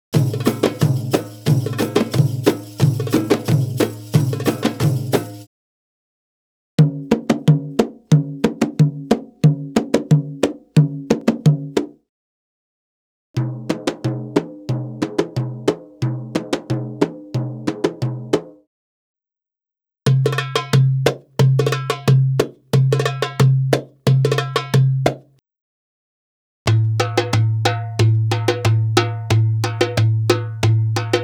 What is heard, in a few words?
Arabic Rhythms